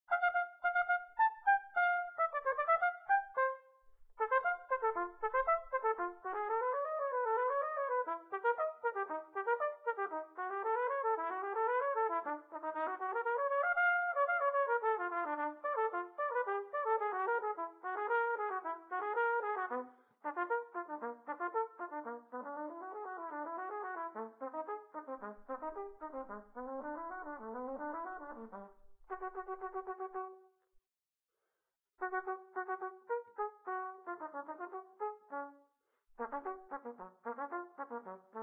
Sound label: Trumpet; Zomboid; Lifestyle